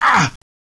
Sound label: npc pain